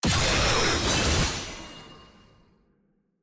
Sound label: sfx skill; evelynn